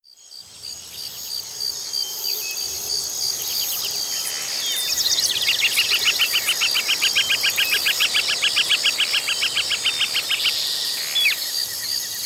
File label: Environmental Noises; Super Smash Bros. for Nintendo 3DS and Wii U; Pikmin